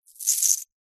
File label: animals dog leash metal chain place into hand; zapsplat